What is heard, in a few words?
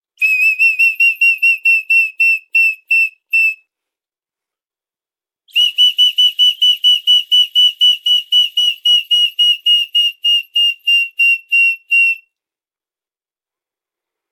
birds; hisui